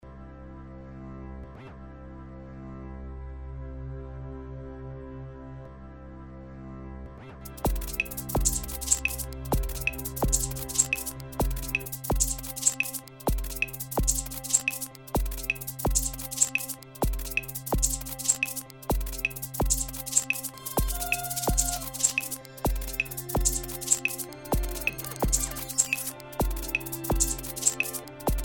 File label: instrumental; 2006 glitch instrumentals